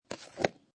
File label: Remove Plastic Lid From Coffee Jar; Lid Sound Effects; Lids (Sound Effects:Objects)